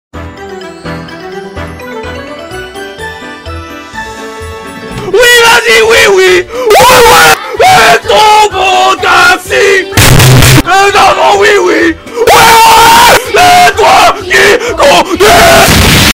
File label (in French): Générique français cover